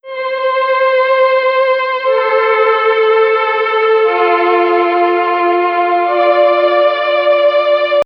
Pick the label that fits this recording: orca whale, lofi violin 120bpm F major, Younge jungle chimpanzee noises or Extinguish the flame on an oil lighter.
lofi violin 120bpm F major